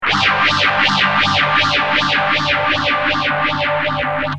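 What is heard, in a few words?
guitar DOD phasor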